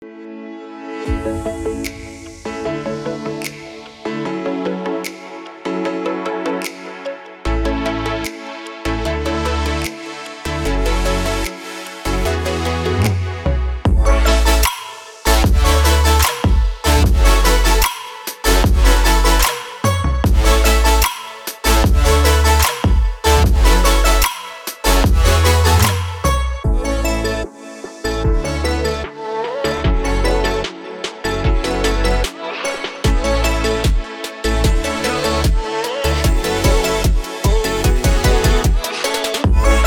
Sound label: Inspiring Happy